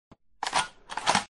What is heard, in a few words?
reload